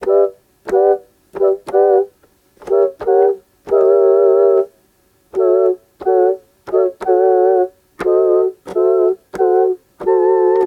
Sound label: 90 melodic loop toy Dmaj